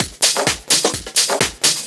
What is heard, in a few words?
Discohouse Loop 128 BPM